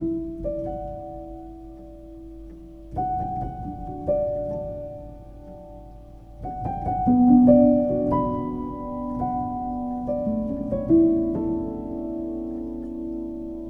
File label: loop piano keys